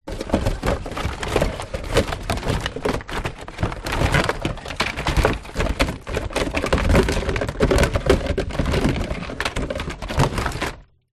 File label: leisure toys plastic pool beach in plastic tub rummage